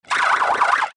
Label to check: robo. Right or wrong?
Right